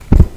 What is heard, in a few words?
book close